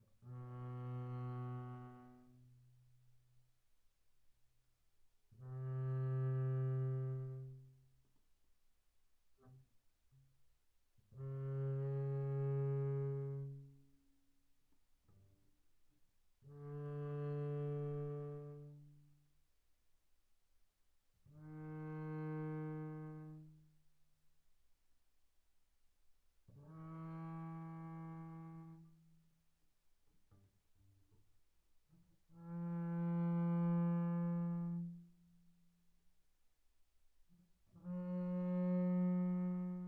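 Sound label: Bass arco sul D pianissimo B2 A3, double bass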